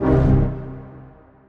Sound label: string stab